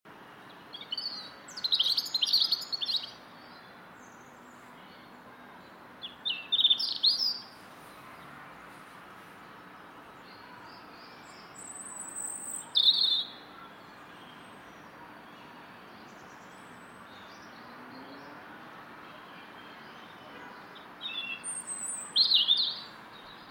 Loud Garden Robin in the Afternoon, robin birdsong London